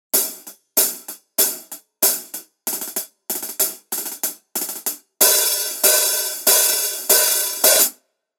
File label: Resonant Hihat 14 Rock Heavy